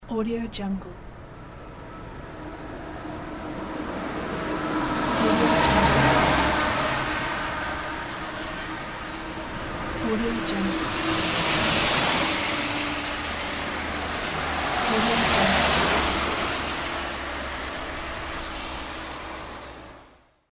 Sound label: highway